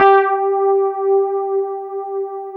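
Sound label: Fender Rhodes G3; Keyboards Old School; USB Soundscan vol.09; AKAI; FEND.ROAD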